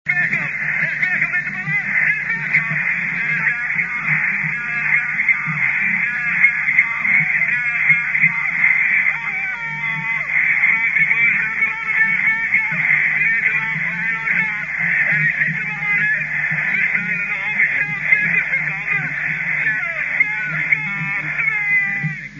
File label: scream